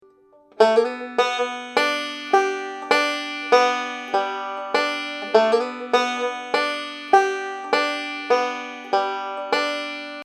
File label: banjo licks